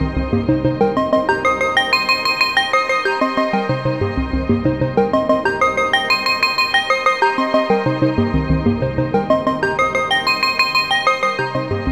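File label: SEQ KORG, synth; AMG - Now CD-ROM (Roland); Old Gold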